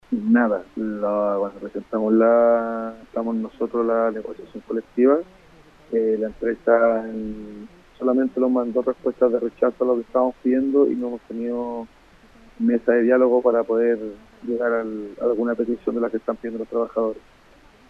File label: SAXOLINE